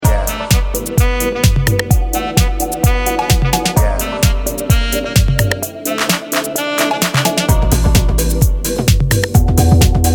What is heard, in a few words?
808 Jazz